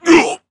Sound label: monster damage, voice